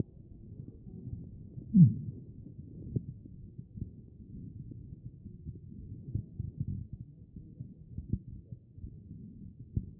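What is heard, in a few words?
anterior chest, position 4; B MODE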